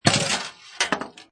Pinball Sound Ball Launched Slides And Stops; Video Game Sound Effects; Lynne Music

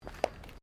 FOOTSTEPS THONGS (FLIPFLOPS) CONCRETE STEP; Footstep Foley; Footsteps (Sound Effects:Human)

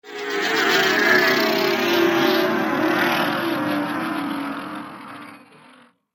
Strange Falling Horror Sound; Scary Soundscape Sound Effects; Andrew Fleming

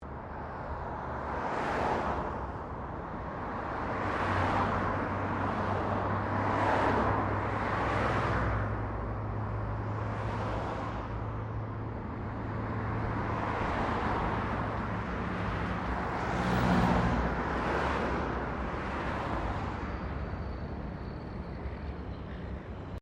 Traffic Cars General Pass By; General traffic sounds; Traffic and Cars

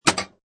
Pinball Sound Up And Down Clank; Video Games (Sound Effects:Games)

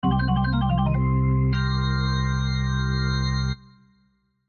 Organ Blues Fill; Organ Blues Fill Chord; Keyboard; Musical; Blastwave

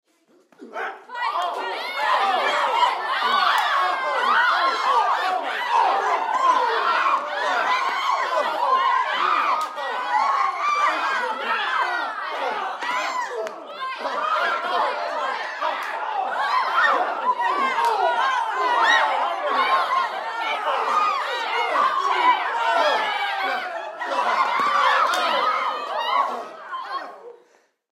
Human Crowd Studio; Crowd Reactions Bar Fight Cheer Long; Blastwave

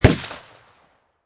Pistol Rhuger 44 Magnum Single Shot Designed

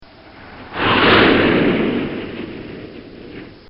Fa18 Hornet Fly By

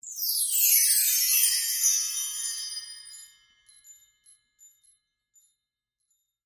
Wind Chimes; Sound Effects:Musical; BlastWave

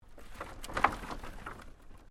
Wood Planks Move

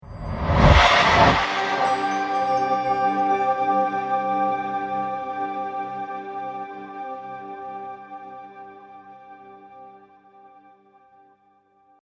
Ancient Magic Trailer Hit; Trailer Sound Effects

